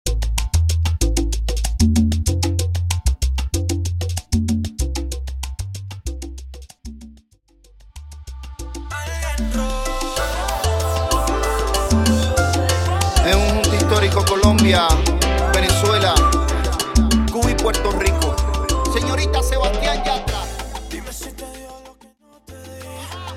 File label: Intro Outro Simple 95 BPM